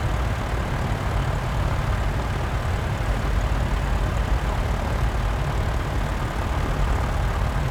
truck engine

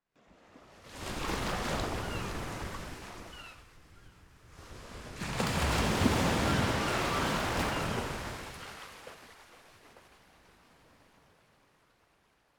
beach, ambience

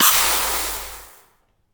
gold sword cold

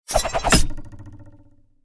knife plank

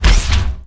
brandish sabre

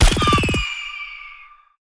fire boom shot

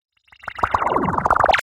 ui sclack

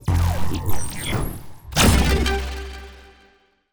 ui settlement

